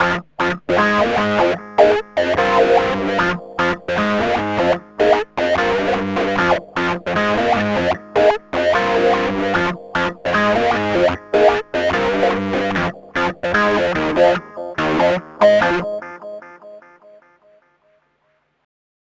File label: Rhodes Attacks; Ueberschall